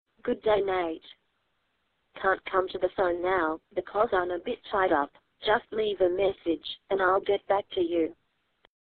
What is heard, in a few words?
Good Day Tone